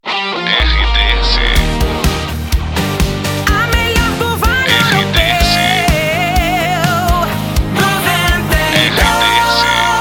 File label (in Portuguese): SB CURTA FM; 92 FM